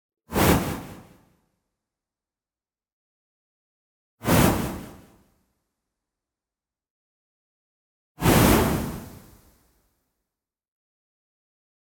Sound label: WHOOSH White Noise Hard Long Fade Out; boom library; Anime Essentials